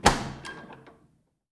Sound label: Control Door; The 39 Steps